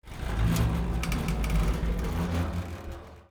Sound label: metal stress; Counter Strike Model Pack; CS:GO; survival